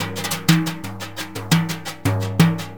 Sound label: Synclavier Percussion, World & Orchestral